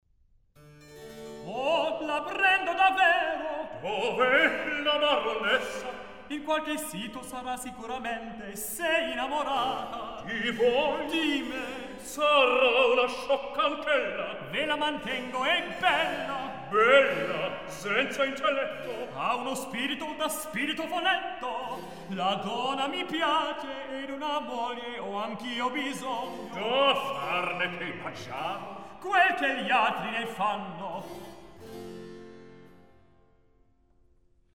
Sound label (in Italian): Recitativo; Oh la prendo da verro; Mozart; La Finta Semplice